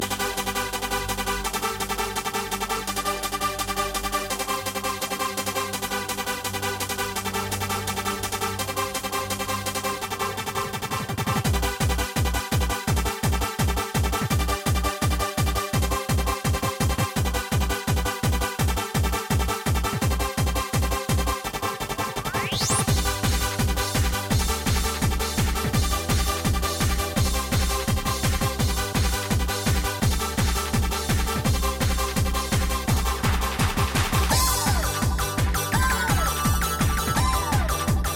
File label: euro techno